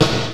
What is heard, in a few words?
TURBO SNARE